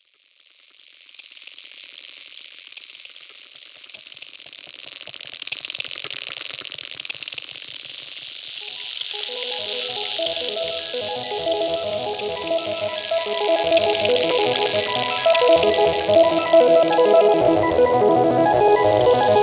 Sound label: Ambient music